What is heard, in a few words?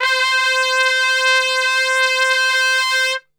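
C 4 piccolo trumpet, Brass; Best Service; Brass Super Section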